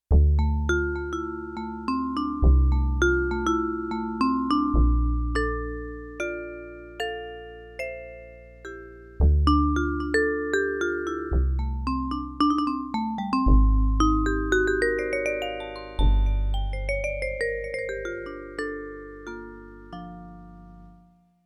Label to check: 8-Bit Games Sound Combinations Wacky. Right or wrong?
Wrong